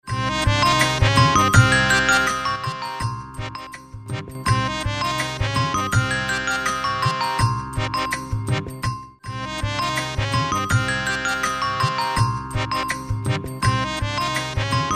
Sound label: BGM